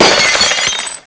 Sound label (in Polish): szklo